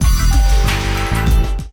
win; Amatic; Casanova

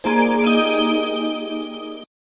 free spin init; Amatic; Lucky Zodiac

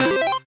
expand symbol; Amatic; Golden Joker

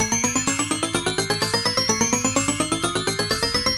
count up; Amatic; Lucky Little Devil

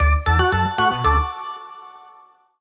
win; Amatic; Fortune Girl

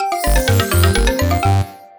win; Amatic; Chinese Spider

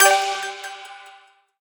collect end; Amatic; Chinese Spider

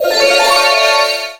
win; Amatic; Book of Fortune

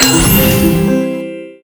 bonus chance; Amatic; Grand X

